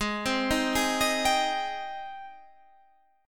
Ab Major 7th Ab down Guitar Standard; AbM7 arpeggiated; JGuitar

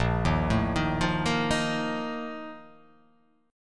Bb Major 7th Suspended 4th, chord; arpeggiated; JGuitar

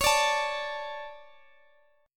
D 5th C sharp; D5/C# strummed; JGuitar

